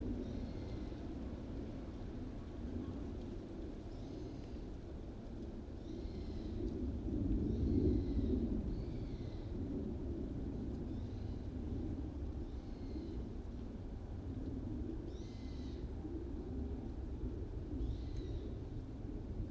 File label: yamanakako sound